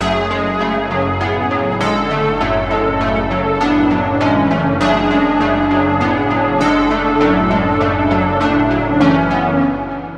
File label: Key; Routed Sound; Spire Expansion - Street Vanquisher Vol.1